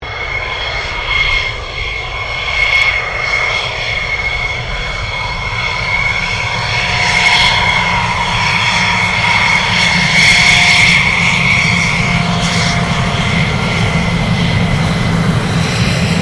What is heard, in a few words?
FA-18 Hornet taxi, stereo; RAAF FA-18 Hornet; Airplanes; Vehicles